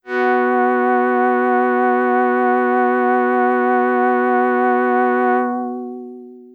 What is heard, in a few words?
PAD Strings